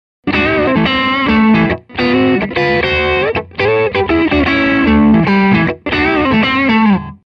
Hollow classic bridge & neck, pickups; Hollow classic VA2 & VA1

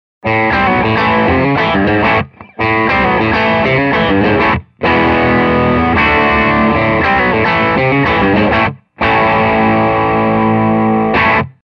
Suhr chambered T broadcaster bridge, pickups; Rockin

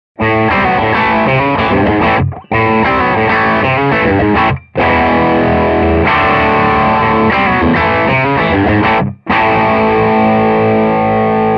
Hollow T M3 bridge, pickups; Rockin

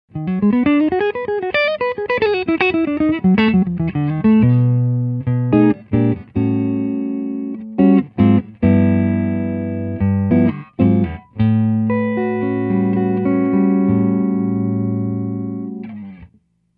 Hollow T neck, pickups; Boppin

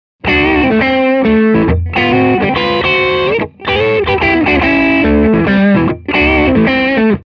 Hollow T bridge & neck, pickups; Stoppin

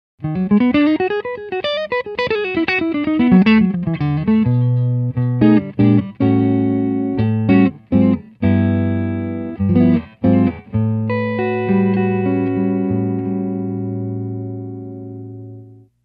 Hollow classic neck, pickups; Hollow classic VA1; Boppin, neck pickups

